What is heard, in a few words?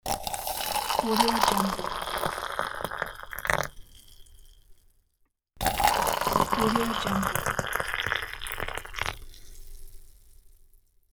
pouring coffee or tea; desmondsyner